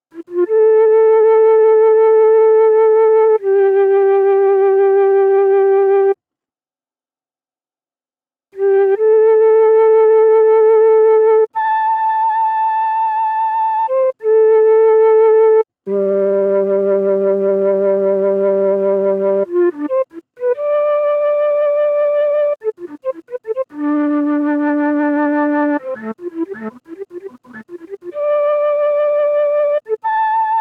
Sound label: Gm90 Mellotron Solo